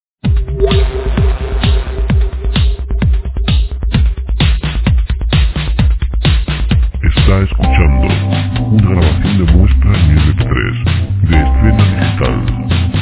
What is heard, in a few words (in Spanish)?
hojas sintonias; musicalibredederechos musica television